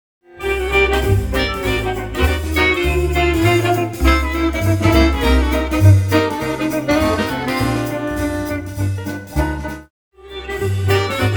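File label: tenor saxophone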